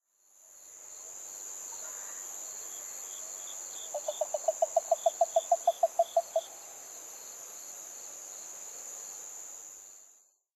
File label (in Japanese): yaeyama harabuti gaeru